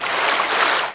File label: WATER; Canon; CameraWindow